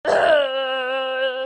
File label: Uhhhhhhhh